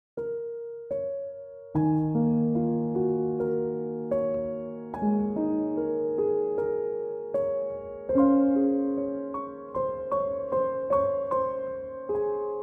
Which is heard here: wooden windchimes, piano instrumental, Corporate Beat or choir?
piano instrumental